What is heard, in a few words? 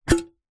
medium small sized metal stockpot cooking pot empty lid remove; household; zapsplat